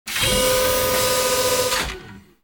office doors

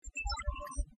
engage, computer

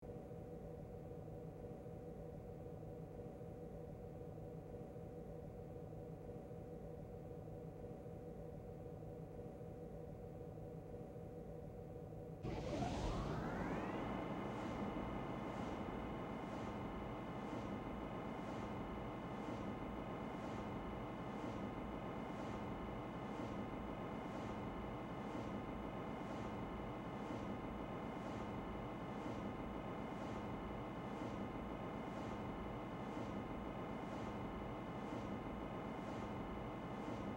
turbolift

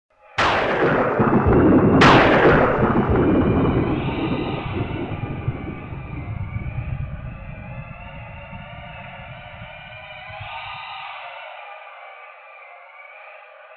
planet thunder; background; TOS; Halkan

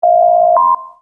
door chime; VOY

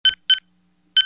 computer beep sequence

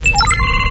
ambient bridge, computer

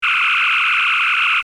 phaser

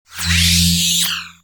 holoemitter; holodeck; EMH

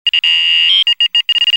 tricorder

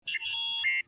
input ok clean, computer

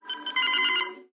alert, computer

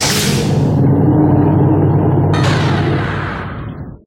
cargo bay; TNG

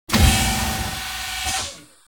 door

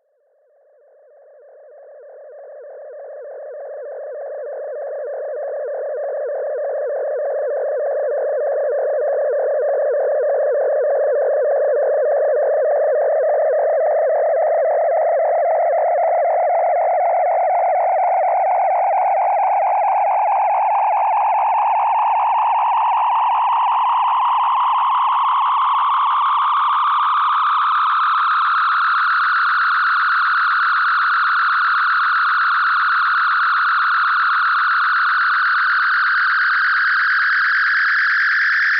phaser overload

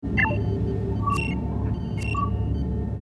computer beep

